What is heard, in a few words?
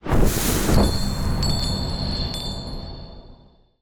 cast generic; casting